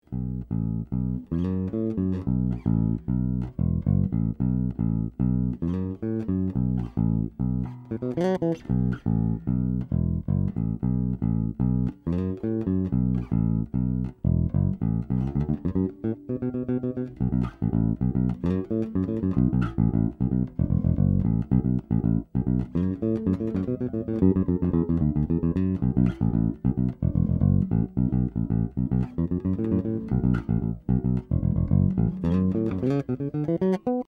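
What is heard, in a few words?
groove bridge, basso elettrico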